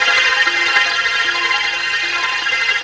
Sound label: I Spy Pad - 3A; Jungle; Pads; Blu Mar Ten; Jungle - 1989 to 1999